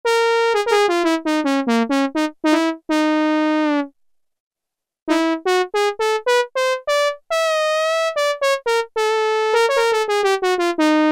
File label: TRUMPET, Oberheim OB-8; Factory Patches